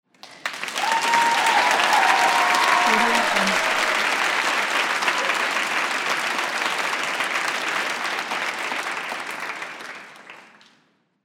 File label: excited applause